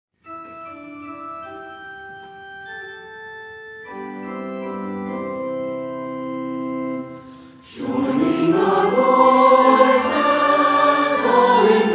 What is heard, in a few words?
Joining Our Voices